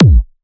Tech Trance Uplifting Kick

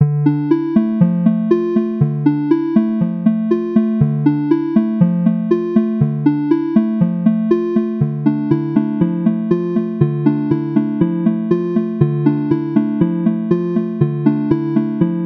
Mysterious Melody Loop

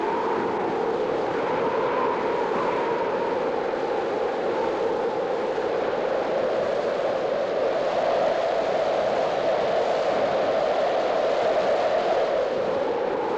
Phoenix Wind

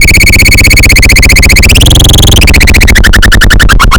Noise

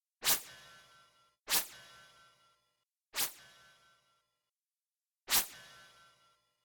Laser Bursts

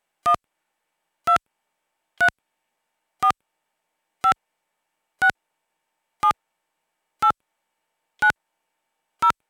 Touch tones uk

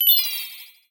Mercury Sparkle

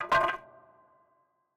Wood Plank Impact Ground

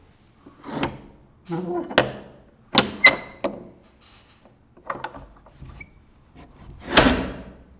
Close Mic Doorbolt Sounds

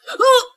Gasp Uuh

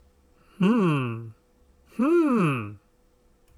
Hmm

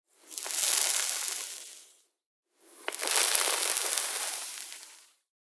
Cigarette Sizzle

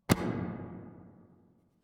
Single Big Impact Large Creature Jumping Down

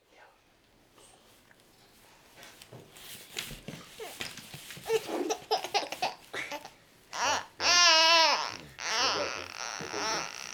Child Cry

